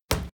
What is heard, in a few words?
Kick